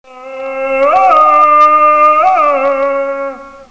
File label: Tarzan